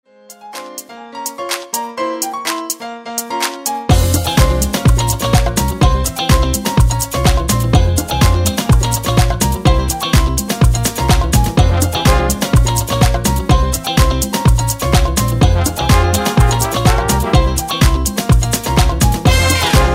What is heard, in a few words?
Salsa